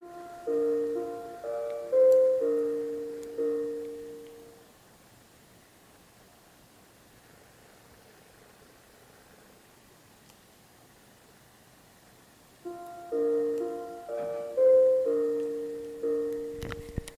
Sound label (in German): pausezeichen